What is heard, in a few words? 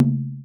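percussion Djun Med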